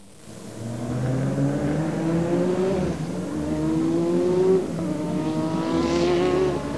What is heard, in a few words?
AUTO, car sounds